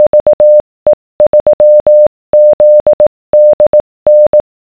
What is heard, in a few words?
morse 18wpm 600Hz